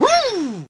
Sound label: "woo", voice